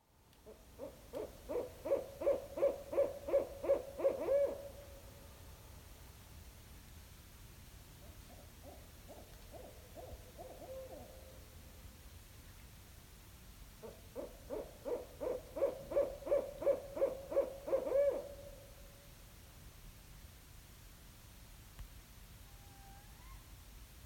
Barred Owl Family

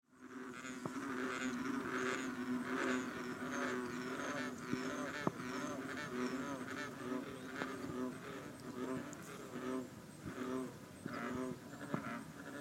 Bullfrogs Beaver Pond; Richardson Road

